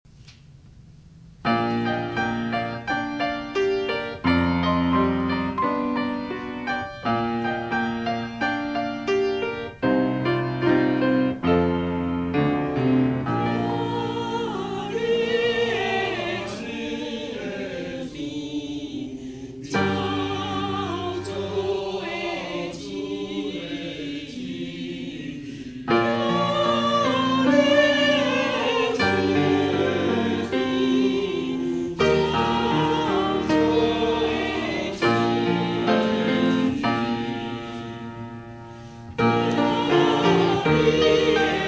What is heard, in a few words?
Choir; taiwanese